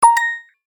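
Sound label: execution sound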